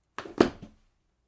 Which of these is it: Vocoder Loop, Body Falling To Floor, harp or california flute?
Body Falling To Floor